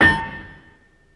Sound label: Anvil Hit Hammer